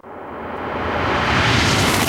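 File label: FX left, attacks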